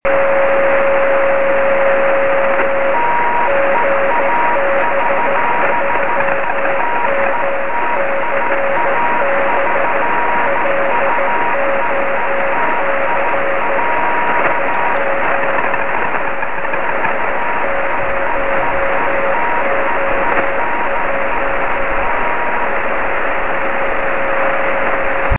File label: beacon 23cm; sm7lcb; gb3mcb